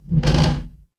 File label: door creak